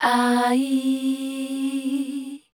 MELODIC C, Voice

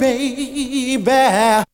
VOC; ZERO-G; Datafile

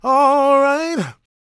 GOSP MALE, Vocal Planet Gospel

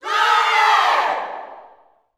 Glory shout, Vocal Gospel yell shout; Spectrasonics; Vocal Planet Vol.1 Gospel; akai

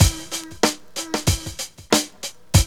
LOOP; E-Lab; X Static Goldmine 2

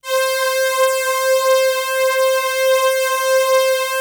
Atmo Pads; Best Service; XX Large

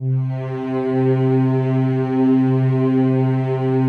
PAD, ATMO PADS; Best Service; XX Large

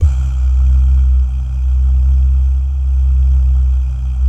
"bah", Vocal Jazz Fx

